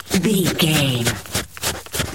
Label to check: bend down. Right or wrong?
Wrong